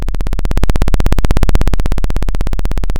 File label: Sound of a chainsaw